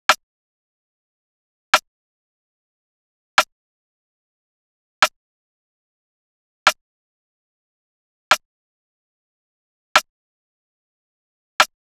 Drum Loop 146 BPM Snare; Drum Loops; Loop Stems; Cymatics; Mystery Pack Vol 4; Tighten Drum Loop

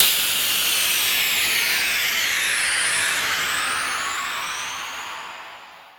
Overhanging Downlifter, Dubstep; Ghosthack - AC2024 - Day 13 - Dubstep Sounds 2025; Effects

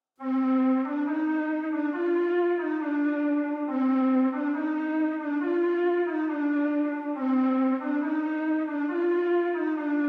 138 BPM C Min Flute Low, loop stems; Melody; Trap; Cymatics; 2022 Melody Collection; Stick Up

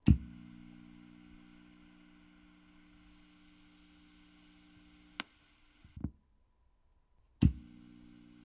Glitch

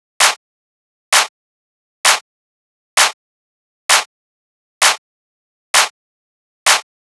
Clap Loop 130, Techno; Ghosthack; Free Techno Sounds 2024

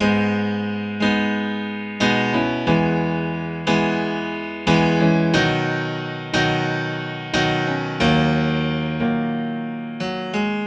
Grand Piano 90 BPM (G# Minor); By My Side; Ghosthack; Free Guitar And Piano Samples

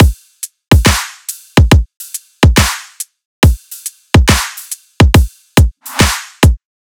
Riddim Drum Loop 140 Full; Ghosthack; Riddim Essentials Volume 2

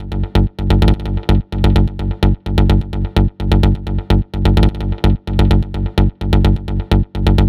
Bass Loop G 128, Melodic Techno; Fence; Ghosthack; AC2024 - Day 12 - Melodic Techno

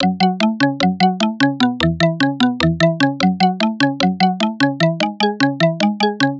Arp F# 150, Melodic Loops; Barrel; Ghosthack - Color Bass Essentials

